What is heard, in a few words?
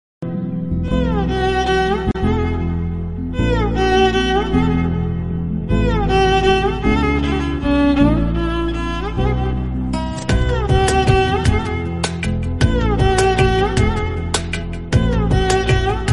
love marriage tune bgm